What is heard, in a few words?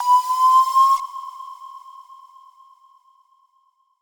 FX Crisp Rise